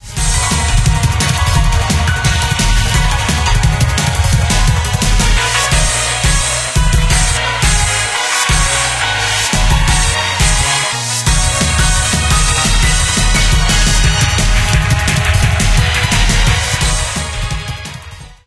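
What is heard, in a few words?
background music crossfade; Invaxion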